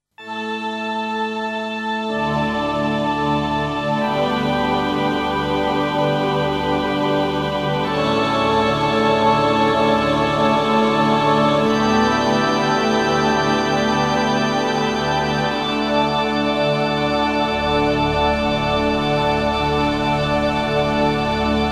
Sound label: Violin